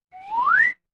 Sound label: cartoon slide whistle